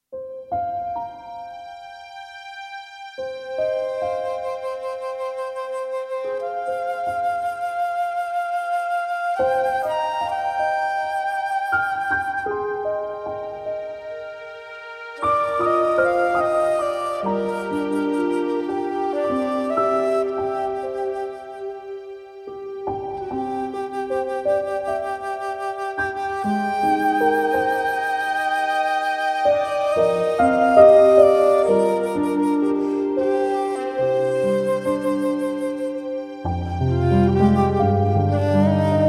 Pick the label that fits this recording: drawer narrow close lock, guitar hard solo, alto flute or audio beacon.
alto flute